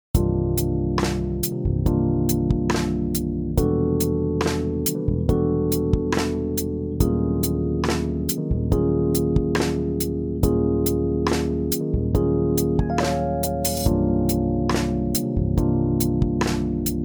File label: neo soul piano chord progression